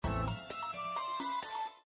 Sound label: radio sfx slow